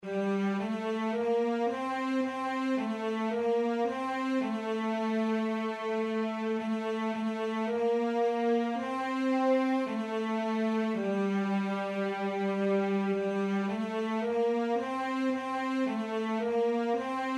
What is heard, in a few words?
tenor midi; Boska dobroci